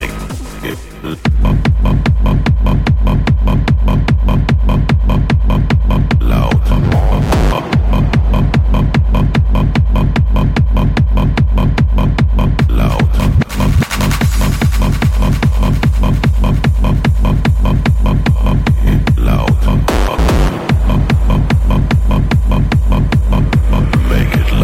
Hard Bass

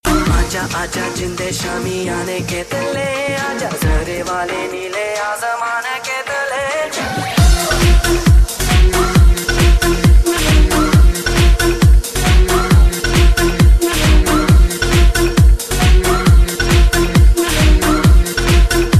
Remixed Tone

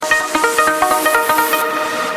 Sms Tone

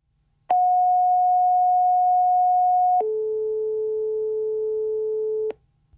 Fire Tone

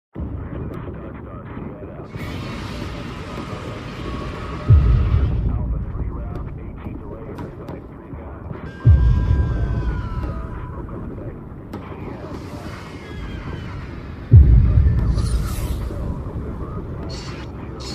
Godzilla Attack

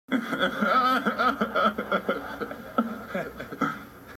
laugh; Kawhi

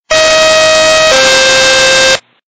House Tone; Fdny

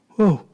WOAH

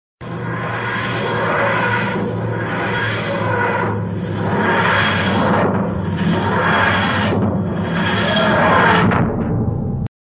TARDIS

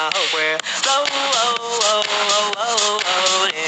Floating text

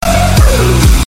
Woohoo

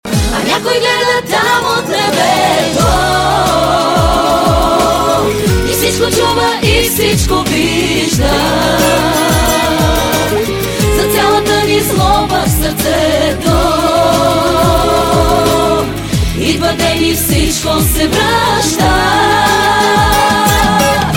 Chalga

